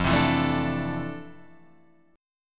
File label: F Major 13th F down Guitar Open F; strummed; JGuitar